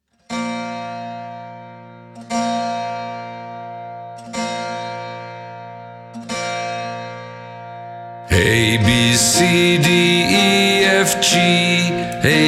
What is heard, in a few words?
Alphabet Song